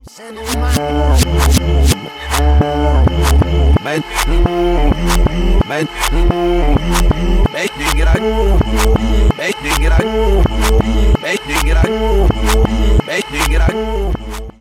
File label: song reverse